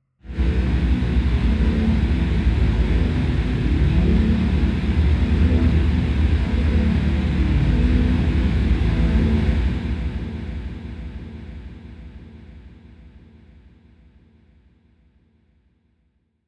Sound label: airy choir dark, drones; musikelemente